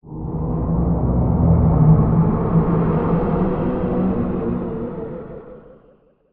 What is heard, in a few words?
ambient; toxifin